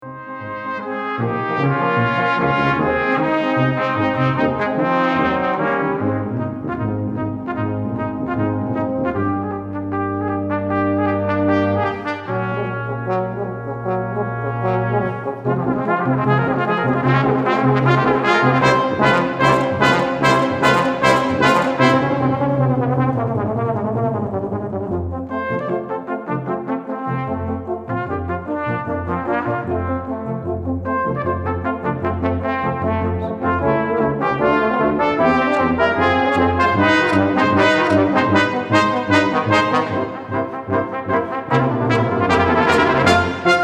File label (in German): Streichquartett BRASSQUINTETT